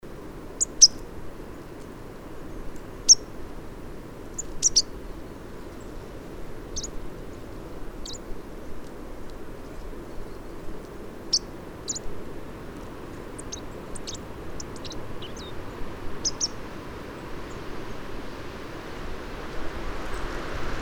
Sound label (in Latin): Poecile palustris; SETE